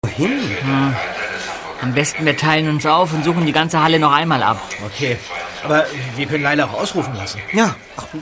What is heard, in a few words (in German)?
Ausruf